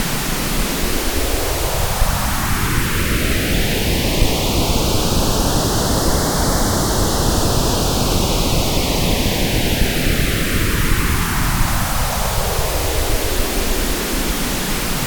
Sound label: stereo polyphase